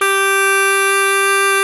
kortholt G#3; SoundBytes - Early Patches

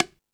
Latin Drums, E-MU Pro-Cussion

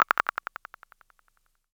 Delayed click, Univox SR-95

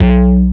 106 Bass B, One Shot Bass

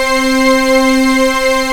PAD C4, SYN PAD; MKS

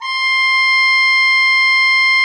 C6, Cathedral Organ; ILIO; Propeller Islands

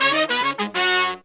96BPM, Horns

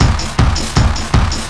Drums Beats

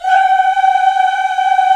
VOX, African Aahs, African Las

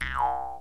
JEW'S HARP

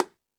Jungel Percussion, E-MU Pro-Cussion; drum machine

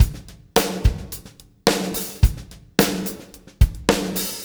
PULL, Backbeat, PULL UP; Spectrasonics

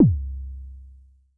K High sub, Vermona Mono15 Drums Kicks

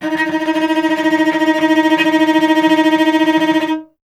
STR VC D#2, Cello; Miroslav Vitous; Solo Instruments VOL-1

